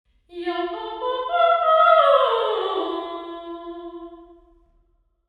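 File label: impulse response sing, St Margarets church; national centre early music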